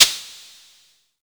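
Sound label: short noise; USB Soundscan vol.14 - Special FX Twisted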